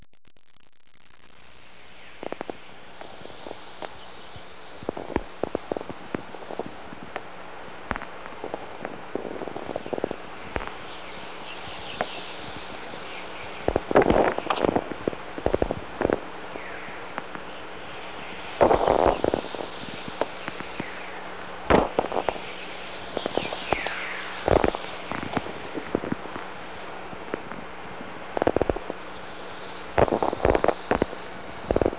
vlf dawn chorus micro pulsations; mideke

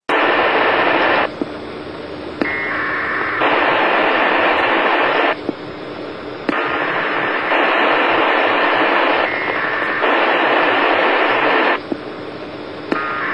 packet qso, Radio; pcsat uo40

